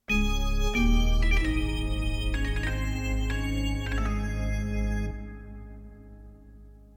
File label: Arabian Short Sound